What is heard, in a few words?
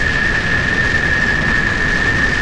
Rocket Loop, Rocket Launcher